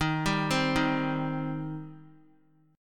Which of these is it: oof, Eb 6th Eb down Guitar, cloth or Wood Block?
Eb 6th Eb down Guitar